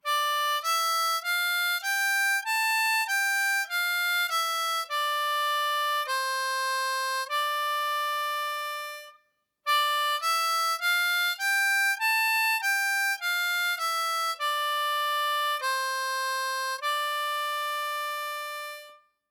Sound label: Single Note Exercise, D Minor, Blues Harp